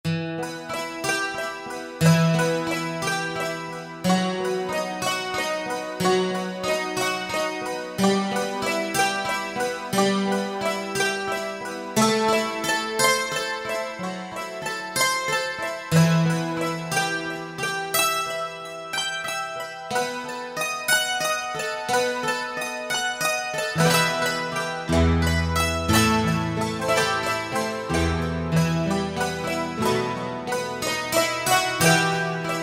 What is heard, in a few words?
Mandolins, Roland SR-JV80-07 Super Sound Set